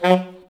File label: SAX A. STAB, Alto Section; Roland L-CD702